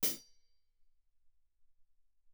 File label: hihat, shoulder, mf; Percussion; cymbals